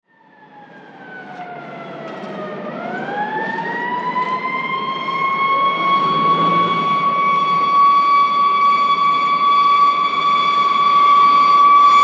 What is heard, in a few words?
Police Siren City Long Approach; Emergency Vehicle Sound Effects